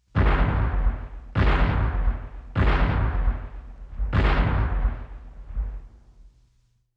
Cannon Shots

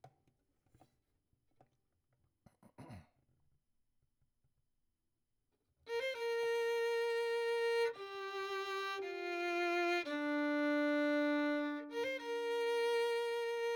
Violin; Verdigris Music

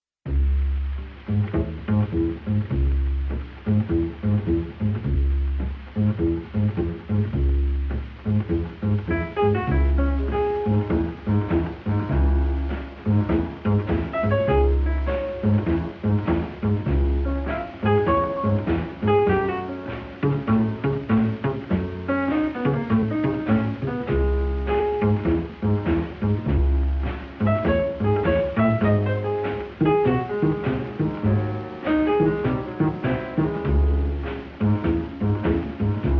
Blues in C Sharp Minor

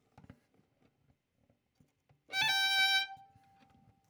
Violin

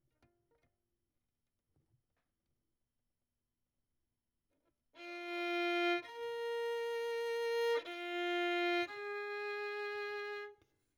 Violin; Verdigris Music

